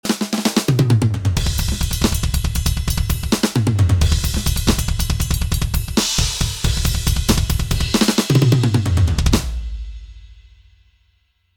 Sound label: Metal